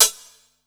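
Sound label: closed hi-hat, Drum & Bass, 160 BPM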